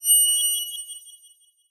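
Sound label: sound of a beam of light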